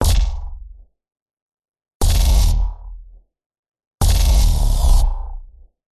science fiction laser bursts punchy; Audio Mangler

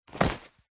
clothing jeans denim pants trousers catch; zapsplat

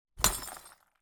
impact glass bottle hit break with hammer

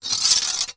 foley metal strip scrape on brick; zapsplat

